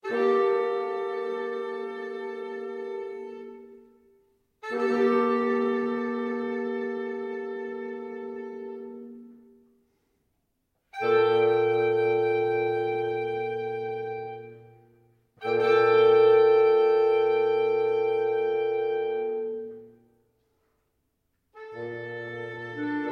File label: Wind Trio; Mvmt II